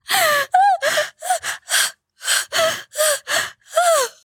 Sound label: moan; devour